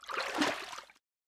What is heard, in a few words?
water splash; civ13; civ14; Effects Fluids